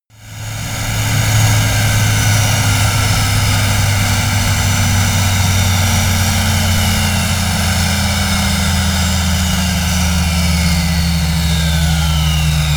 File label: plane bombardier learjet turbine slow down